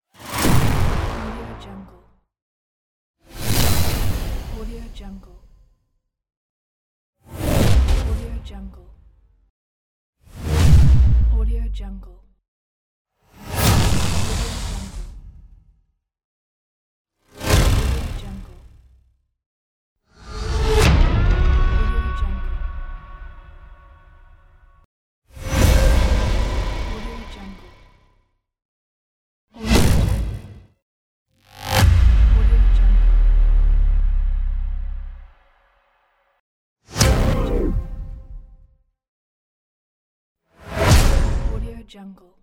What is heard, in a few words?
cinematic